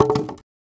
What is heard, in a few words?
Drop Wood; FREE SFX PACK VOL 3